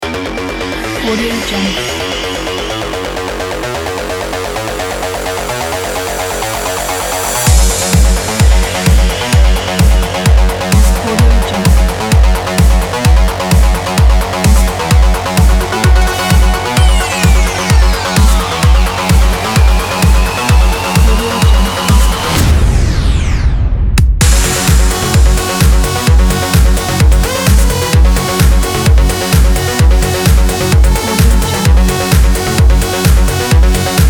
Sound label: electro sport